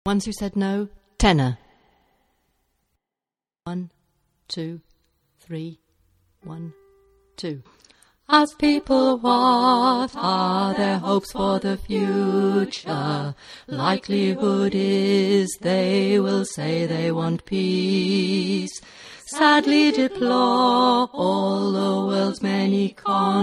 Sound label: tenor